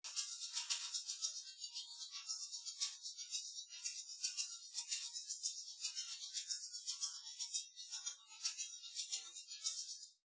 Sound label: drums; SiSEC10; underdetermined